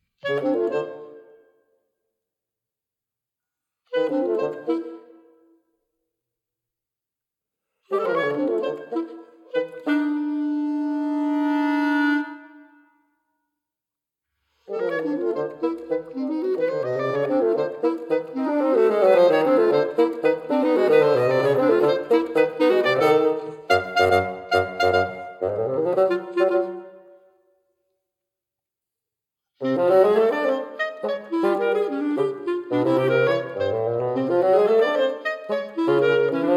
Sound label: Latin Dance